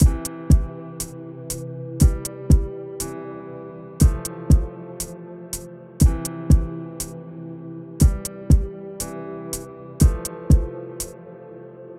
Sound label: chill hop